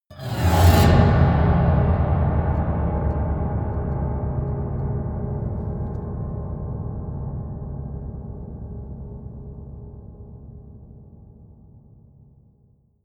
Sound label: piano twang fast squeal long